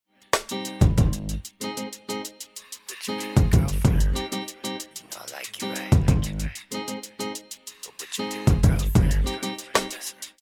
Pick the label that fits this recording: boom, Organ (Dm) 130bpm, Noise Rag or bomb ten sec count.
bomb ten sec count